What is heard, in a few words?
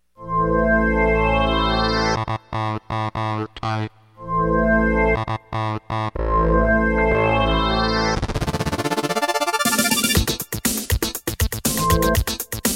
Level 1 BGM alternate, PC Engine CD-ROM; r-type complete cd turbografx 16; Irem